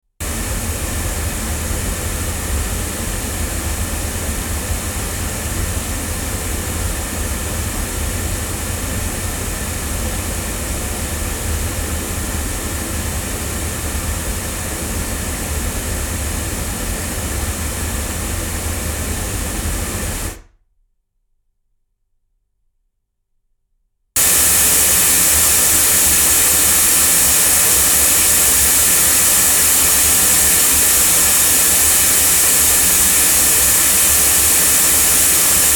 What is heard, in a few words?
Sontronics Orpheus omni loudspeakers